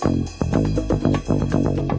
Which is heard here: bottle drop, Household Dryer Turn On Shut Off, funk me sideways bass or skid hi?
funk me sideways bass